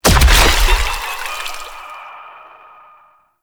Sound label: Ink Wall Bounce; Gameplay; Warframe; Shadowgrapher